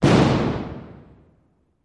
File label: 1x4 Oz Concussion